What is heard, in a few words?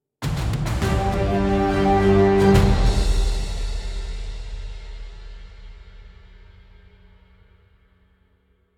Boss End 138bpm